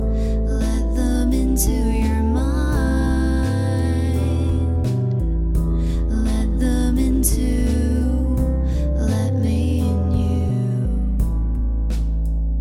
Is Calling Pop

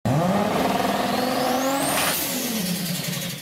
Turbo Sputter

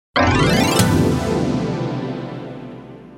Harp

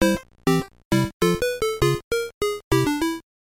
Game Over 2; SMB

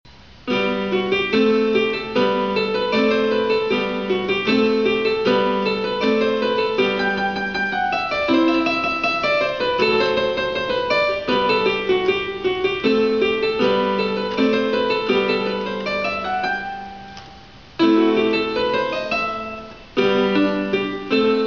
music, electronic ringtones

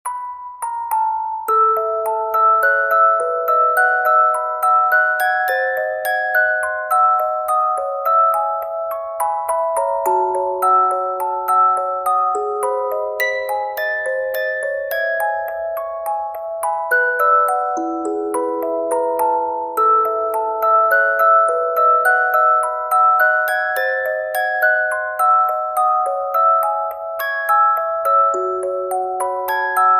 Music Box

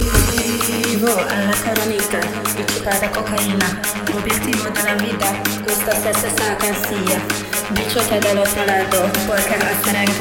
mix, electronic ringtones

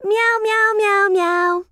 meow; Neptunia; 5pb

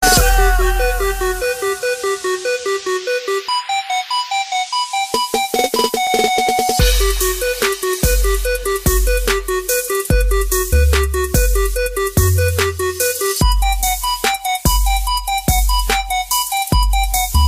TRAP Alarm

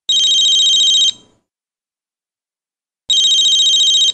Ringer SMS Tone

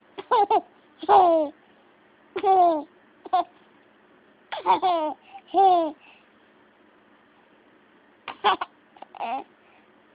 baby giggle

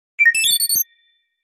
Minimal Tone

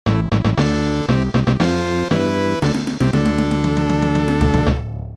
Fanfare; Kunio-Kun

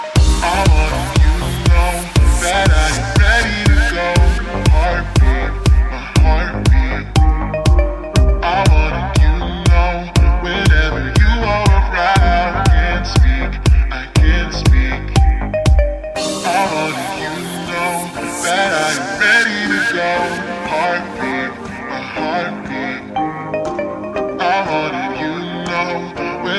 My Heartbeat Remix

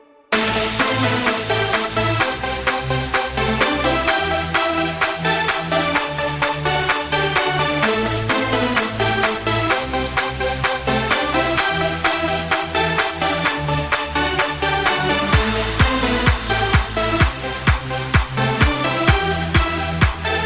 Electro melody

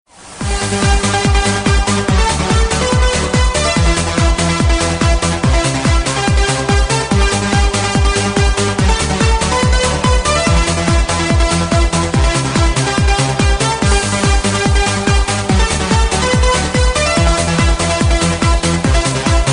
Techno Remix

